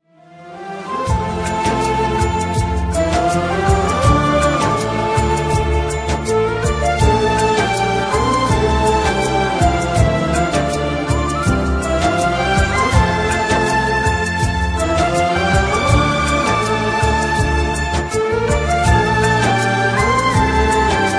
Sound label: ringtone instrumental